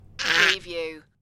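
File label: Toddler Achoo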